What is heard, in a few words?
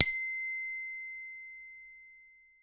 Bell Chimes; Samuelgremaud